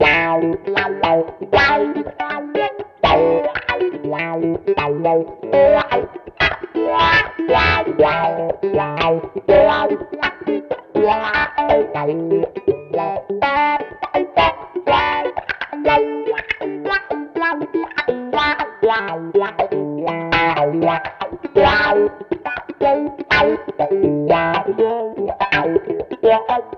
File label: strat; rmc wizard